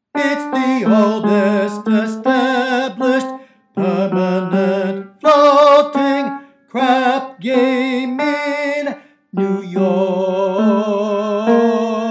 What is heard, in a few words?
baritone